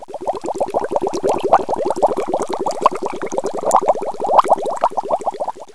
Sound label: bubbles